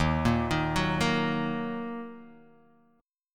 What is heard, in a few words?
D sharp Minor D sharp, Guitar Open D; 1,1,1,0,1,x; chordshape